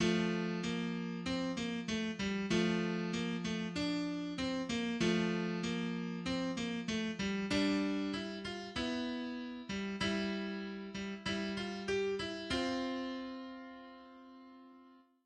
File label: noodling